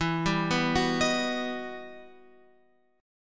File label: E Minor Major 9th E